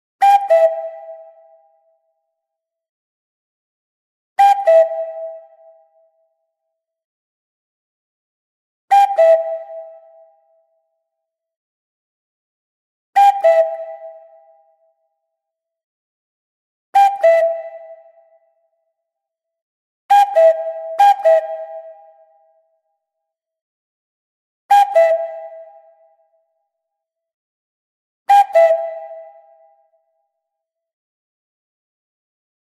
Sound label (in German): klingelton kuckuck